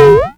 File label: minimoog, techno acid